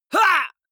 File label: male fight hit shout; comedy-cartoon; soundpool